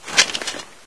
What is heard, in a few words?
gun pickup, items; mistake coop